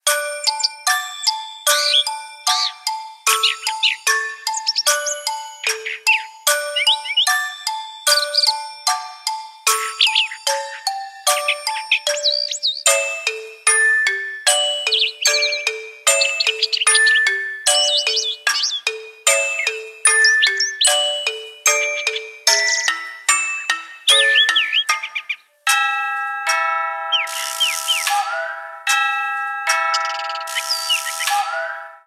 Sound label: Dawn of the Jungle, alarms; ZTE; L111 Blade